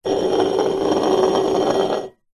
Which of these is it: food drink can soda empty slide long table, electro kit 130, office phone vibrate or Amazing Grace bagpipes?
food drink can soda empty slide long table